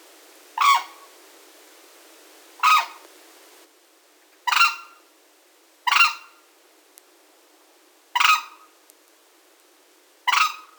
daurskiy zhuravl golos

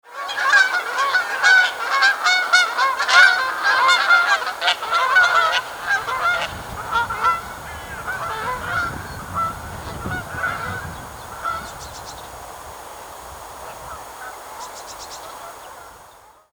kanadskaya kazarka golos

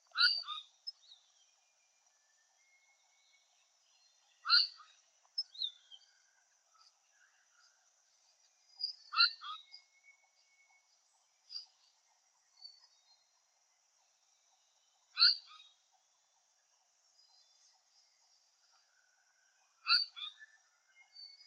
rayskaya muholovka golos

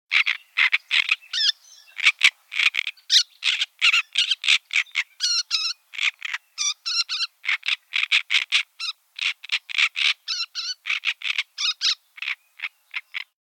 drozdovidnaya kamyshevka golos

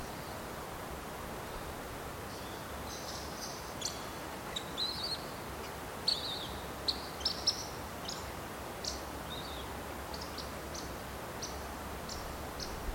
valdshnep golos

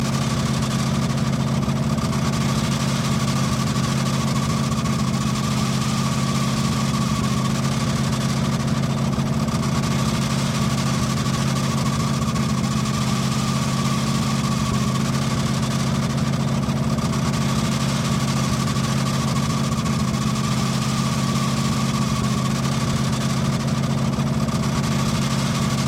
zvuki dvigatelja

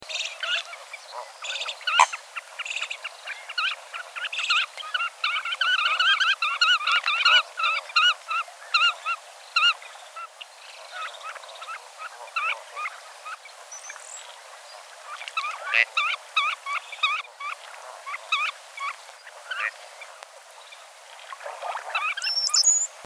chernosheynaya poganka golos

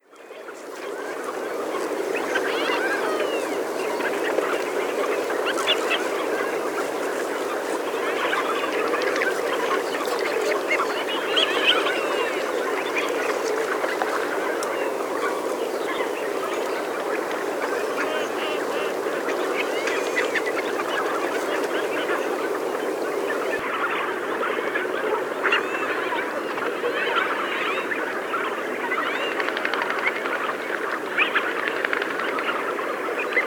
krasnogolovaya chernet golos

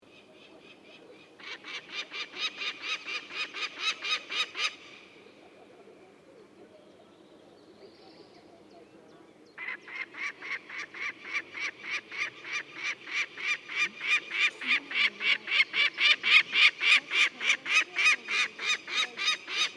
sapsan golos

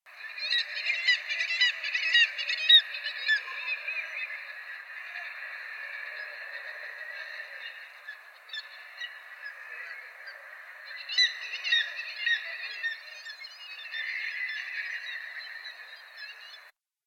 moevka golos

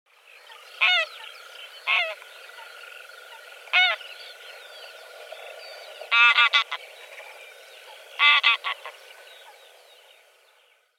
seryy gus golos

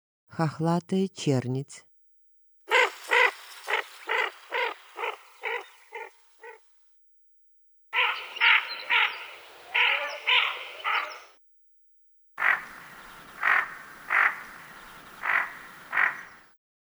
hohlataya chernet golos

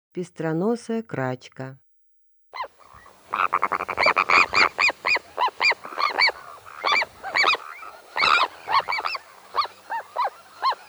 pestronosaya krachka golos

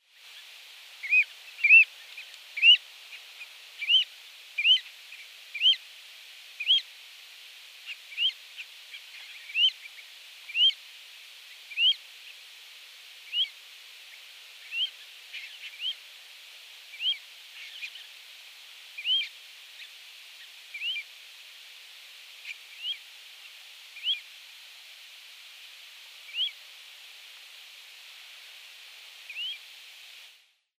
aziatskaya burokrylaya rzhanka golos

